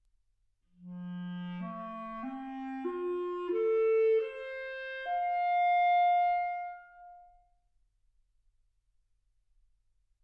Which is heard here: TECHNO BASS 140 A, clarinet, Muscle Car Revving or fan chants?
clarinet